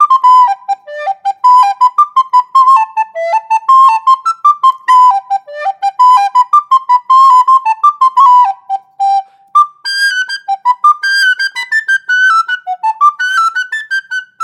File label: Aulos 205A traditional